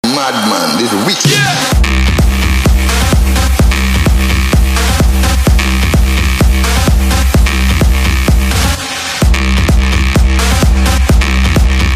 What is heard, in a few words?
drums